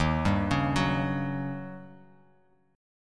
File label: D sharp 7th Sharp 9th; arpeggiated; D#7#9; 11,10,11,11; JGuitar